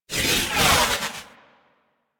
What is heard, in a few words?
Osprey Dodge; Warframe; Corpus